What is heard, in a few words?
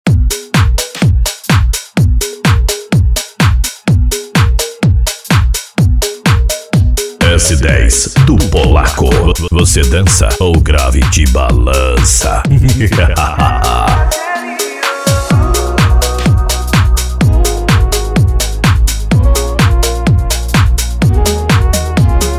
electro funk